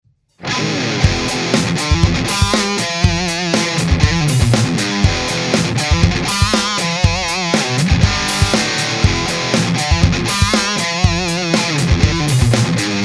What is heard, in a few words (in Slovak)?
mikrofon c.3 poloha c.2, Test mikrofonov na git. aparat